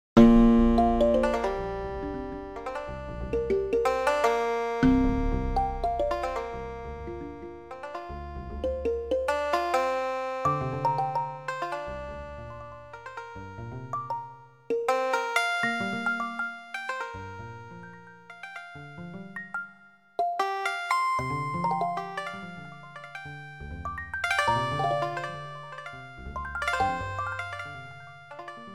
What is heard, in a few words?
evolving melody for bass recorder, kalimba